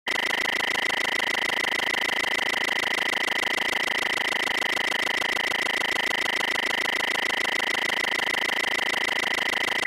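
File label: Computer Read Out Fast Repeat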